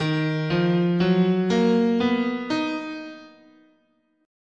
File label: D sharp Hirajoshi, scale